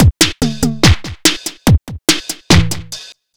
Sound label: Crunch, Drums Beats